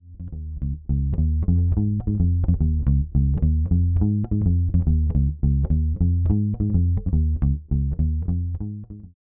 Fender Tonerhammer Drive 12 EQ bass boost low mid cut treble cut